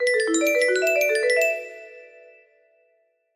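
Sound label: music box melody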